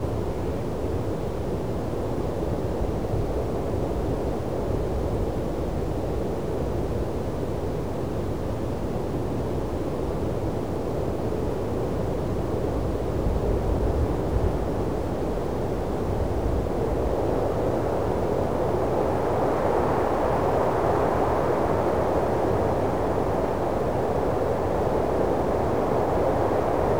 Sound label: Nurburgring day, Ambience; Project Gotham Racing 2